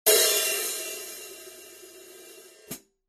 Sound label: 14in Rock HiHats, cymbal